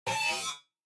bad noise

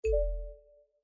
Modern Interface Activate

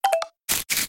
click on purchase fail; golden wheel; City Verse Tycoon

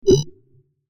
chat send ban

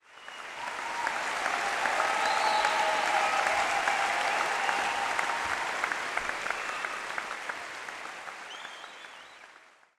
crowd Chatter arena; spellbound

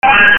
WAAAAAAA

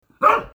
barking

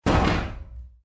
plane

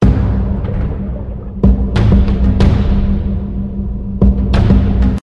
menu; Nutmeg Football

